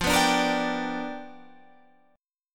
G 7th Sharp 9th F chord

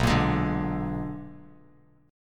B-Minor Major 13th, Bass Standard; BmM13; strummed; 7,13,18,7; JGuitar

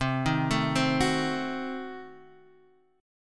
Gb Diminished 7th

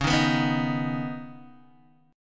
Db 7th Suspended 2nd, Guitar, DADGAD

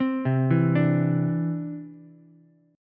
C Major Add 9th, Ukulele; arpeggiated; JGuitar

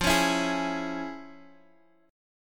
F Minor Major 7th Sharp 5th, F; 10,13,12,11

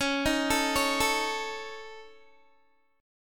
C sharp Suspended 2nd Sharp 5th C sharp Guitar; C#sus2#5; arpeggiated; 21,18,19,18,21,x

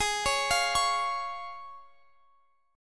C sharp Major Ab; arpeggiated

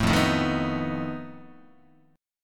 F 7th Flat 5th F down Guitar; F7b5 strummed

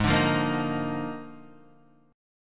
Eb Major 7th Suspended 2nd Suspended 4th Ab

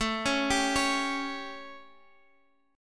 A 7th, chord; arpeggiated

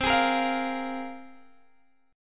C 7th Suspended 4th Sharp 5th, Guitar, Open G

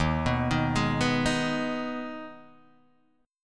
Eb Suspended 4th Sharp 5th, Guitar DADGAD; 1,2,1,1,2,1

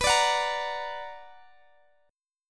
B Minor Major 7th, Guitar, DADGAD

